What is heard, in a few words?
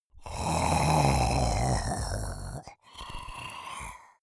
monster choking zombie idle